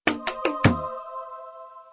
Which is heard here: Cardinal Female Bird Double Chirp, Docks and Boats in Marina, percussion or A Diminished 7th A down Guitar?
percussion